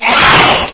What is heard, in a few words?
pain; slender